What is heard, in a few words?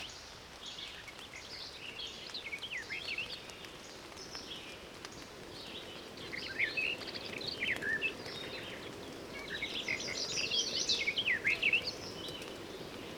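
forest warbler; lesnaya slavka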